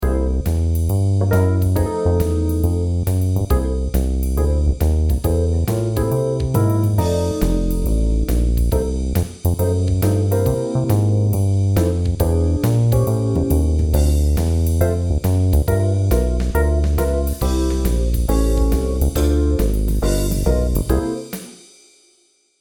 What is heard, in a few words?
String; UA Theme Packs